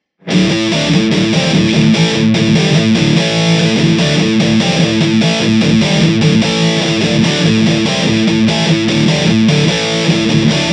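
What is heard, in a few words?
blackstar ht metal 60 overdrive 2 us shure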